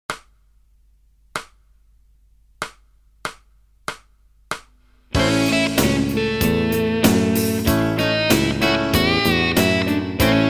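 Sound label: Blues in A, gitaar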